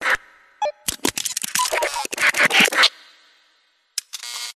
science fiction steam punk machine operate clicks beeps break malfunction; Audio Mangler